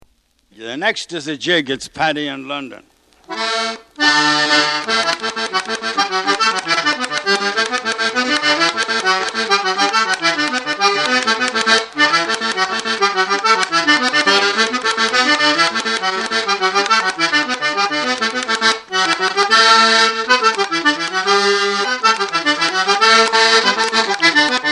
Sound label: Jig; Paddy in London